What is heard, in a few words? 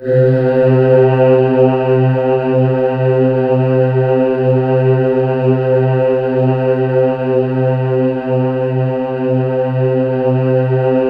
C3, Synth Leads; 90 s Sample CDs; NiceBeats (NiceBytes); Studioline 09